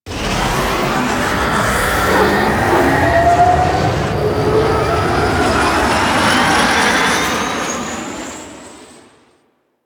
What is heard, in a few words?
Extraction Depart, Vehicles; Zariman Liset; Flight